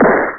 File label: shoot; alienbreed3d2